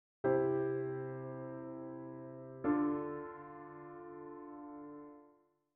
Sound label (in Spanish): cadencia rota fa M; cadencias